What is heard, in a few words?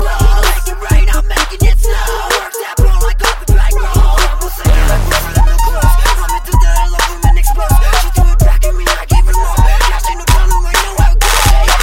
Low Screamo